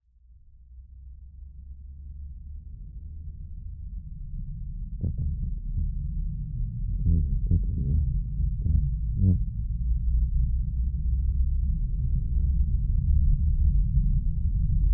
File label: Soundscape Waiting space